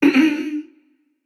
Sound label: clear throat, voicepack; smite; jorm